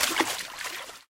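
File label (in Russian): маленький легкий всплеск воды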